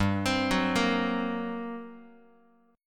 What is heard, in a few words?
G Minor Major 11th G down Guitar; arpeggiated; x,3,3,4,2,x; 4A,4E,5A,5D,5G#,6C#; JGuitar